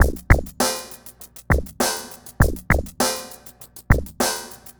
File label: Remixed Drums 100BPM; The Roots Drumloops